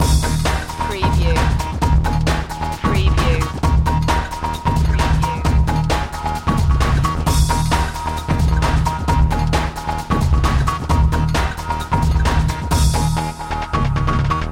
push and pump loop

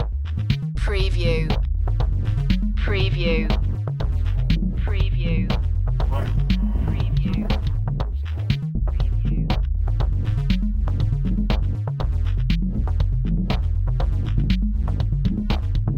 light touch loop

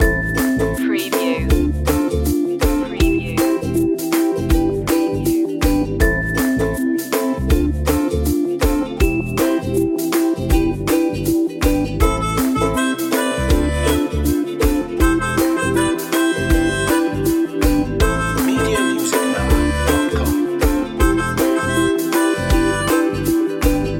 ukulele loop

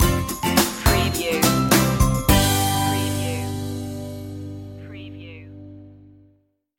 easy and fun stinger

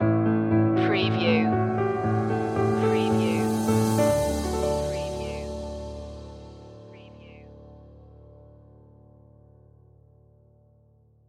the spirit of being stinger

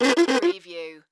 Straw Movement In Out; Sound Effects Bible

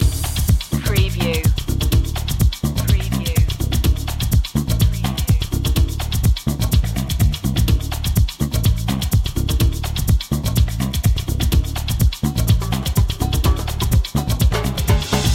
cha cha chase loop

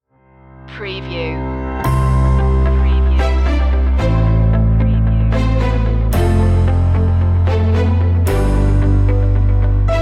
happy go lucky stinger

